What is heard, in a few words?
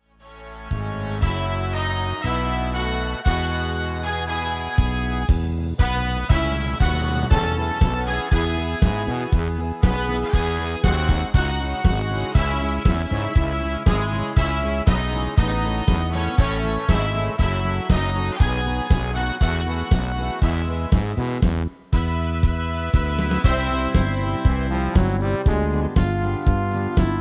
MIDI